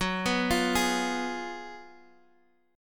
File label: Gb Major 7th Suspended 2nd Suspended 4th, Guitar, Half Step Down